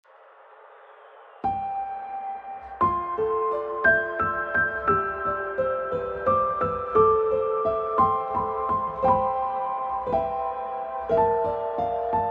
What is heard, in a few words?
siren lullaby